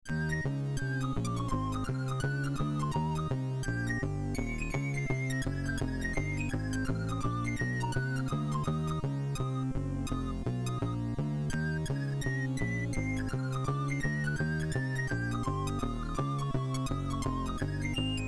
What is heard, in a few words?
Jazz; sid